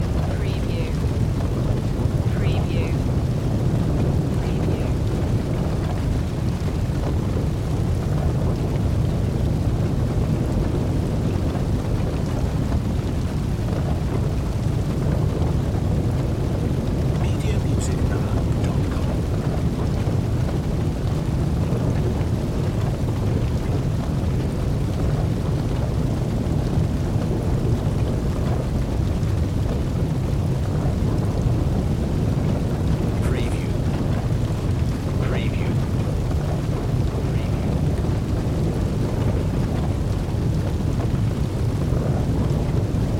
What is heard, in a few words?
Fire Blaze Flames